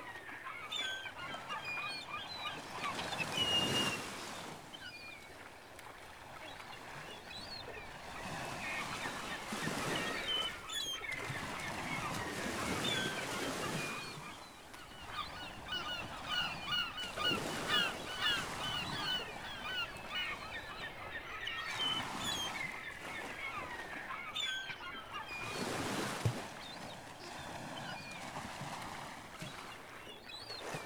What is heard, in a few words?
Ambience Nautical; bratz girlz really rock; ps2 wii